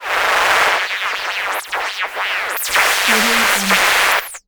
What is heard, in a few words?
energy synth discharge on off; soundmorph